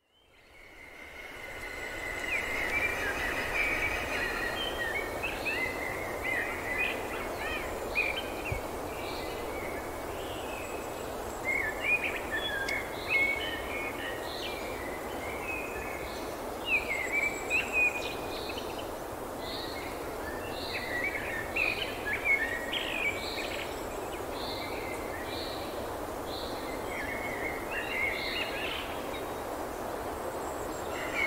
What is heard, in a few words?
alarm sound; Mudita Bell